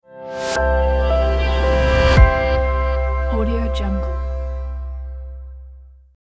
fire swoosh piano logo

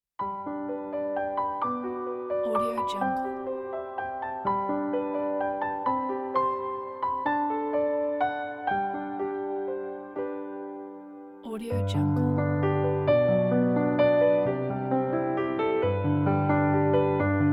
emotional piano arriving home

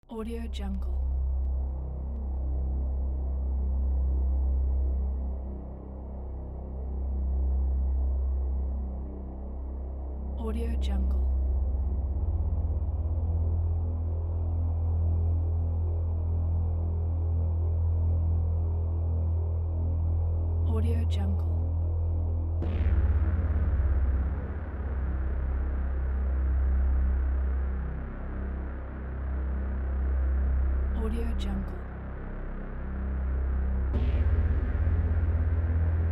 low noise ambience; pashastriker

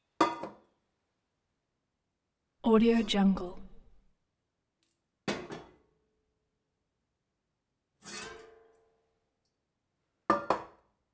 efx int tea kettle actions; collanderson

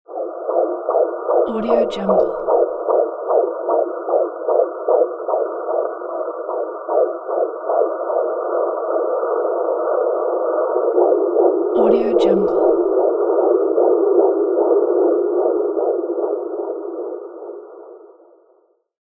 pinard horn baby heart monitor

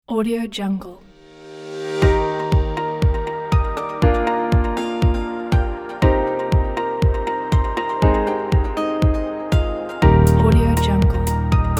optimistic inspiring corporate

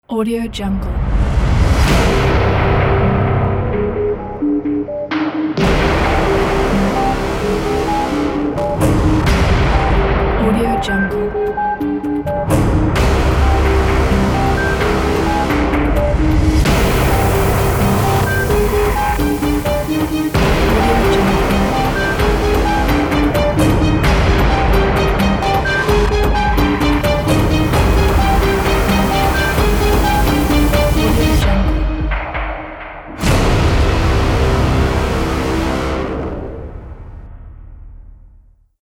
short cinematic trailer; choclatefix

